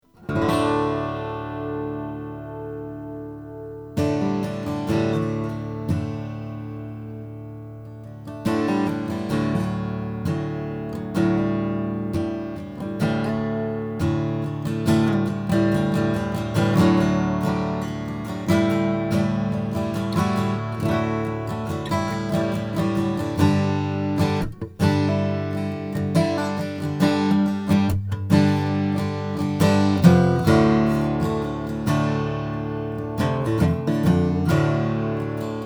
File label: martin d 35 indian rosewood; 1977